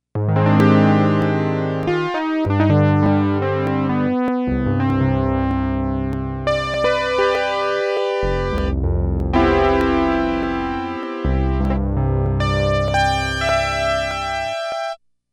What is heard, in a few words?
Soft Piano